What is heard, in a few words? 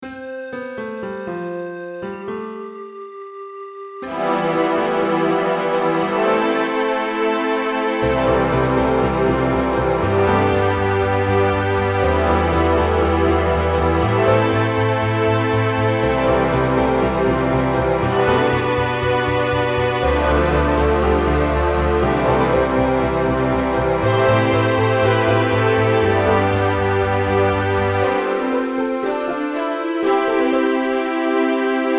piano and orchestra; zenthetic